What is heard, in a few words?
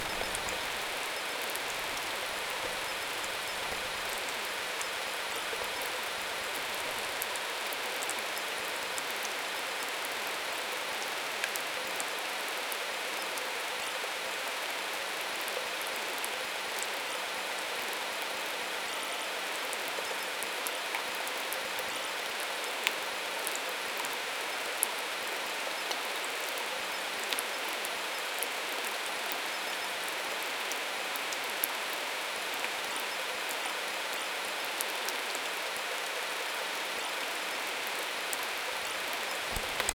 pouring rain